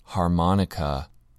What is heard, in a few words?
harmonica